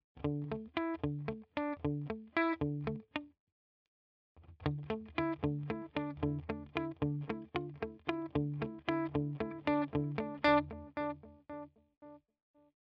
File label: Walrus Fundamental DELAY Analog